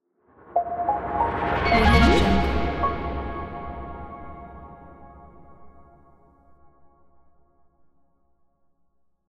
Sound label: web logo